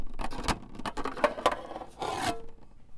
Plastic Clatter; BB Retail Therapy Sample Pack

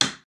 wood hit; wood metal SFX

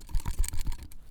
Alien Chittering; BB Retail Therapy Sample Pack

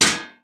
metal sheet; wood metal SFX

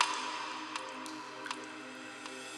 Lovely Clack, Drums; BB 2HTC Samples Vol 4

